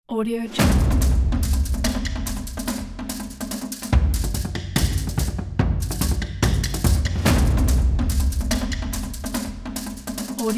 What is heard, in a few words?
the action war percussive